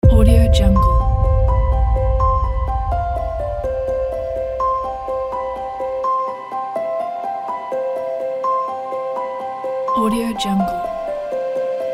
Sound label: valentines day music